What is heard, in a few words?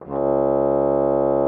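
French horn C#3; Xsample VOL-3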